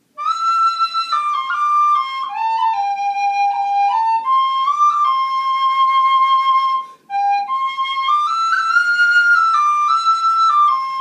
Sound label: WHISTLE